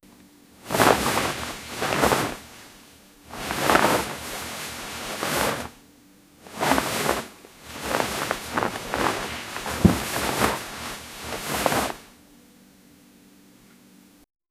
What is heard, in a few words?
bedsheets rustling, bedroom